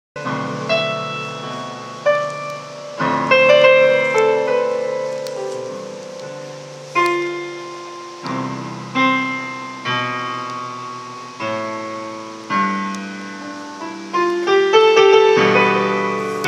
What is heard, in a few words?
piano